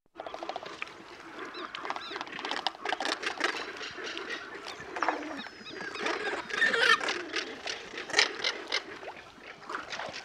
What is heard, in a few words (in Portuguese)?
Tuiuiu